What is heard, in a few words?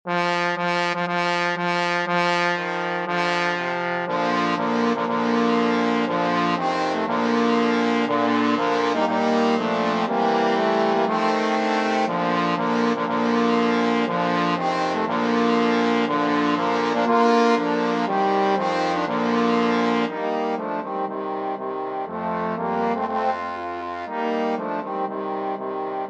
wedding march, trombone quartet